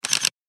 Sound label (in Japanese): カメラシャッター